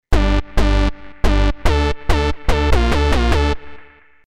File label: Bassline Bright Chor